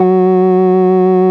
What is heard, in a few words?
FARFISA, F#2, Vintage Keyboards